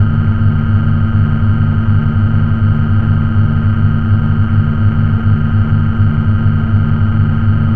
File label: Drone Static; Drums & Drones